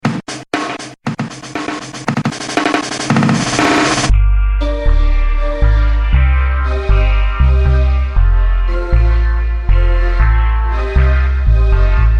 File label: instrumental